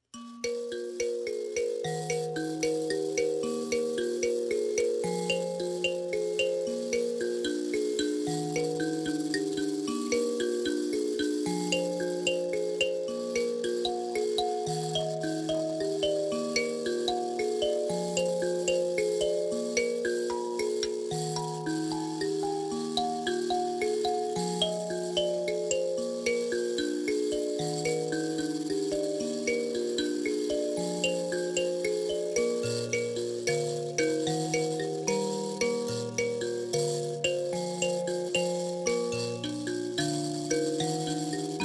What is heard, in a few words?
chemutengure, mbira